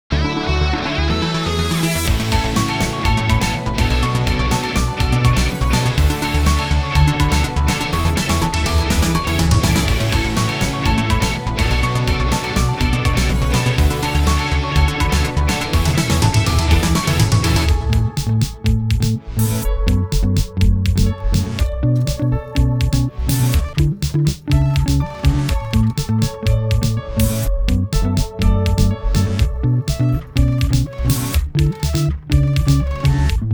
Victory Theme; Cassette Beasts Original Game Soundtrack 2024

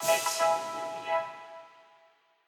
Quasar Clear B

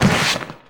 Window Shade Open; the princess and the frog; Diner; Light Street

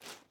ice jump; teardown ps5 windows xbox series xs gamerip 2022

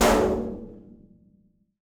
metal hit

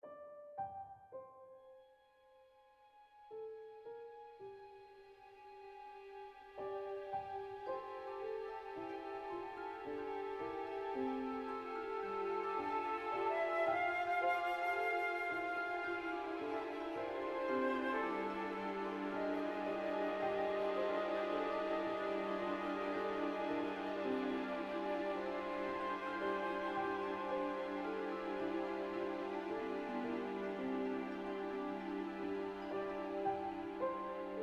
Dream Room; From the Shadows

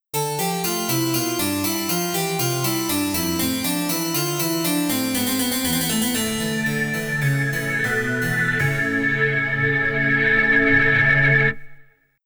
Trembling Baroque Music

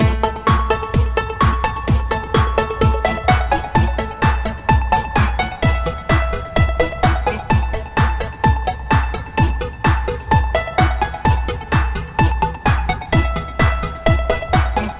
Dance; Talking Pato; Android iOS

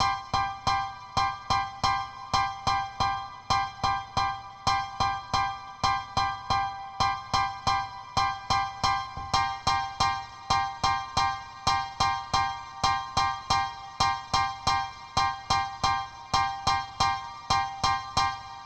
Dance Piano L

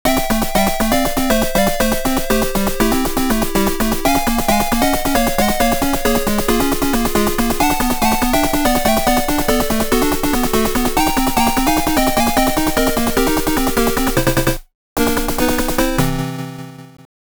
Continue Screen (8-Bit Soundtrack)

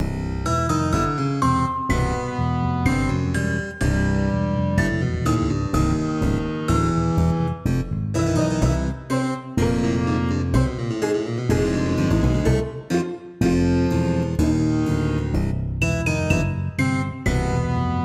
sting gnome invasion; The Sims 3; windows gamerip 2009

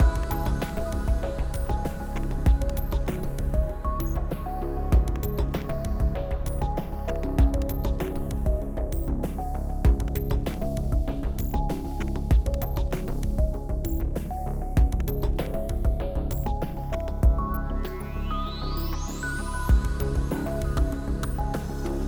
Puzzle 8CH 97 5BPM

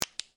Flashlight

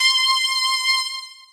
Jingle Go Mono; Shin Hyu Stone; 3DS

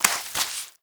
Paint Swi; the princess and the frog; Orleans; Draw Wallpaint; Paint Swipe

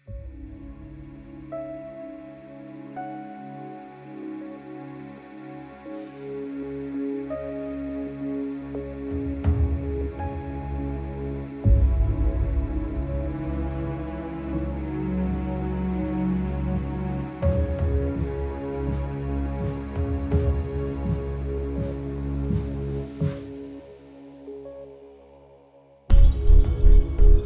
Epic Film Trailer

